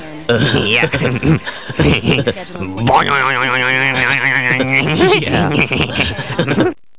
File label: boioing